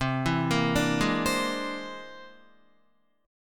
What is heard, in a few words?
C Augmented 9th, Guitar; C+9 arpeggiated; JGuitar